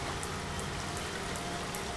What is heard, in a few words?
5 click test